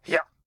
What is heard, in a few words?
Mosskin Attack